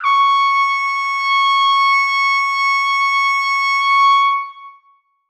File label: trumpet c#6; Sonatina Symphonic Orchestra